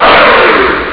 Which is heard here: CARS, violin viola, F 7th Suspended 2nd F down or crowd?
crowd